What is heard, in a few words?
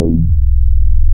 BAS MINI, Mini-Moog